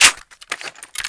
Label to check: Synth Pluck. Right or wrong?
Wrong